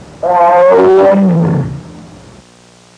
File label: Elk sound